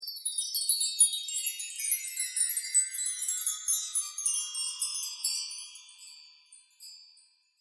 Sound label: chimes